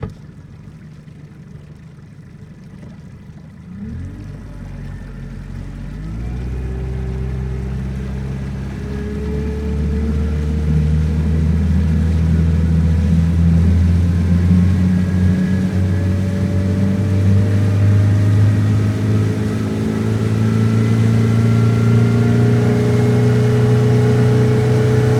Boat Mercury ONBOARD Ramp Up And Down Long ENGINE, Lake Boat 4-stroke 60HP; Dramatic Cat